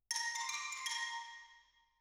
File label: Twin R Xylo